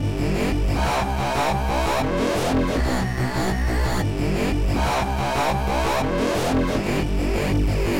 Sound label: Hard N Dirty Synth